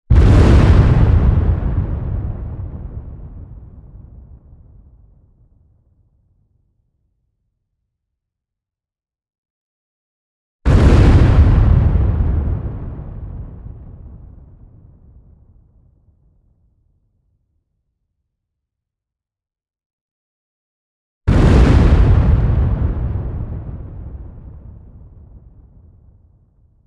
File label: Huge Explosions Mid Distance Wide Sharper Attack